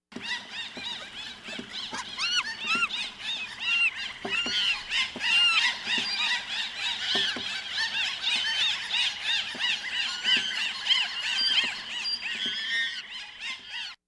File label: Monkeys; Thornberrys